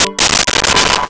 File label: chord